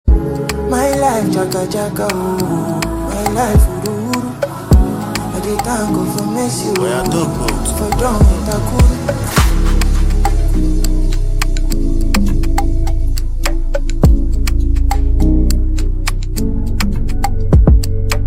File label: Instrumental